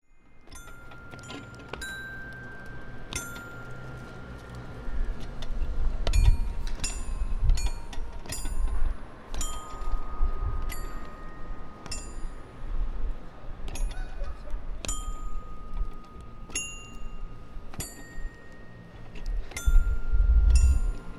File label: pigeon square